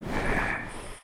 WAR WALK

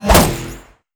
Bthrow Metal, Damage

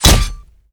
Staff Scale, Damage

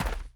Gravel Walk

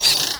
Hopper Damage

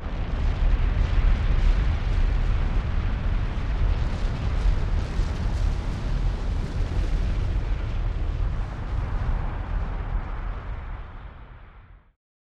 vlocano effect, ambience

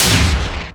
Aiming Launcher

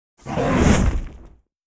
attack; paimon

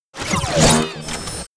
BLADE WAR ATTACK; Mob

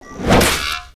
attack; Mob

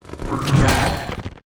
BLAZE BLOCK WAR ATTACK

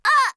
damage; Despreado; Mob

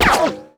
Plasmagun Skin, Damage

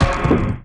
GRAVESTONE PEACE DAMAGE; Mob

